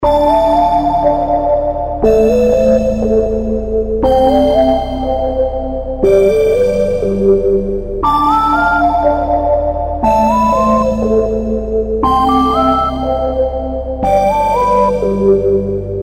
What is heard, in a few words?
mystic music ringtone